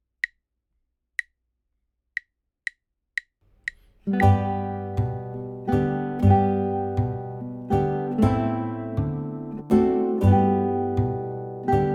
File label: Uke Trio, ukulele